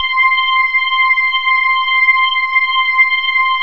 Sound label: MKS80, PADS; Masterbits; Vintage Voltage